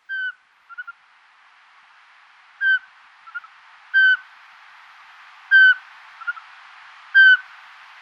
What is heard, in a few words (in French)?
chouette cheveche; environnement